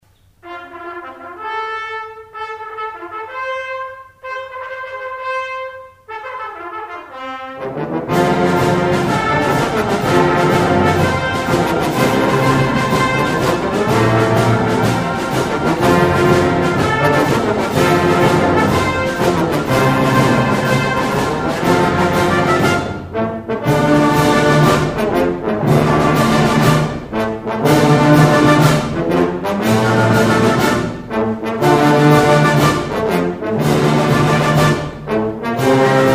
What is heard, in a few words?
Them Basses, Brass Woodwind Percussion; FCC